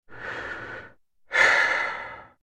Sigh Heavy Male Inhale & Exhale Close Perspective, Human Sounds